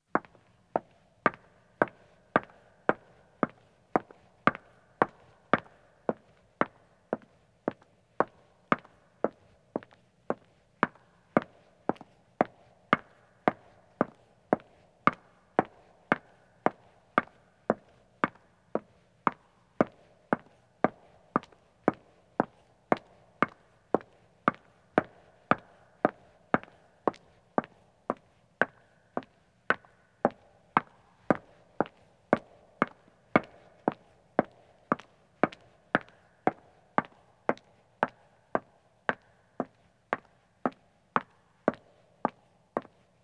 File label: Stone Walking, 1 Woman Starts, Footsteps; BBC Sound Effects Library; Footsteps II